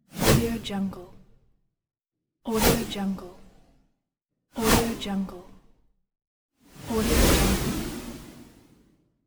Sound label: scifi swooshes